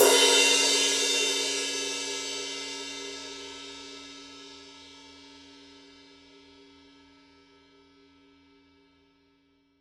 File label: paiste pst 5 rock ride 20 edge